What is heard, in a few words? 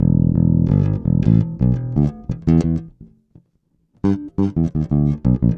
085 Old School Bass E; Pop Rock; E-Bass; Pro Tools Essential Loops; Digidesign